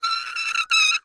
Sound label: Glass Scrape On Glass; Foley